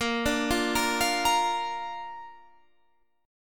A sharp Major, Guitar, Standard; arpeggiated; JGuitar

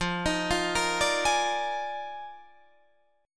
F Minor 13th, Guitar Drop D

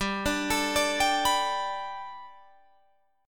G Major Add 9th, Guitar, Drop D

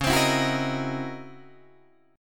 Db Minor Major 13th Db Guitar DADGAD; DbmM13 strummed; JGuitar

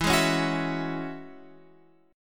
E 6th Add 9th, Guitar, Open F; E6add9; strummed

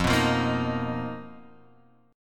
B Major 7th Flat 5th F, chord shape

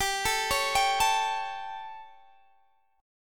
G Suspended 2nd Flat 5th G, Guitar, Open D

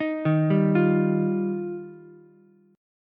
D sharp Major Add 9th, Ukulele C6; arpeggiated; D#add9; 8,3,3,8; JGuitar

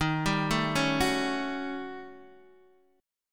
Eb 7th Sharp 9th Flat 5th, Guitar Open D; x,6,5,3,4,4; down

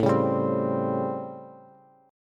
A 7th Sharp 9th A, Bass; A7#9; strummed; JGuitar

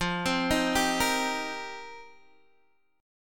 F 9th Suspended 4th F Guitar Open D, chord

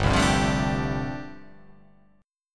G 9th Suspended 4th

